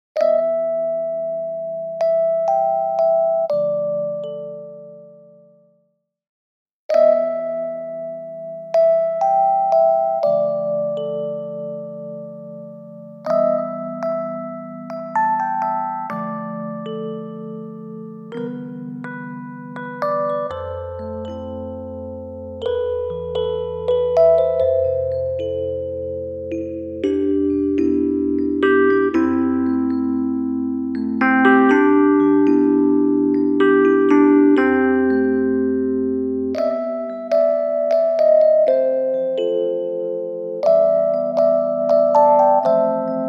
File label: Lexicon Model200 Rich Plate E Piano